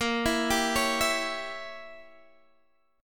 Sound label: Bb Diminished 7th Bb Guitar Open D, chord